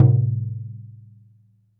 Roland TD-20 Percussion

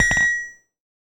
Small Anvil, contact mic, Metalics

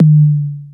KOMMERZDRUMS

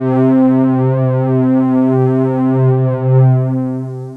JX3P STRINGS, Roland JX-3P; TECHNO-BWARE

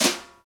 New Brush Snare Flam; Snares

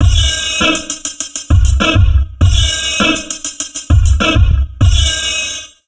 Drum Loops Crazy Processed

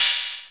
Korg KPR77, Drums

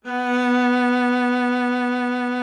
VIOLA B2; Greytsounds VOL-1; VIOLIN+VIOLA

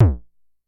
Drum, Vermona DRM1-MK2, Kicks

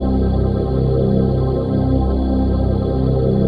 PHASE PAD

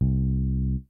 CLASSIC R+B, BASSES; Kid Nepro; Elite

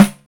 Pandeiro Mute; Roland BK-5; Percussion

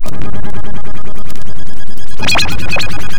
FX LOOP; Big Beat Samples & More; AKAI; FX LOOPS KIT

